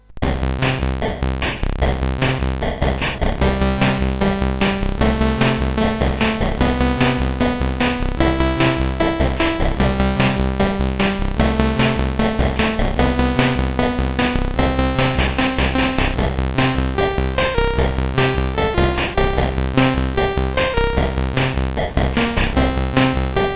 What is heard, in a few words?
Amiga Emulator, CSG8580R5; Scheepers Marco